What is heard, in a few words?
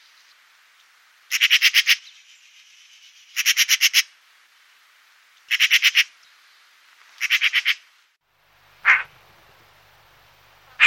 pica pica